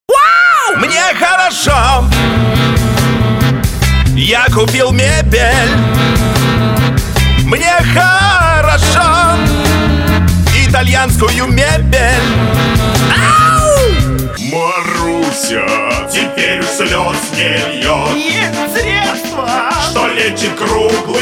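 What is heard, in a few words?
vocal Russian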